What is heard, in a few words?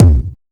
Distorted Bass Drum; Yamaha PSR E305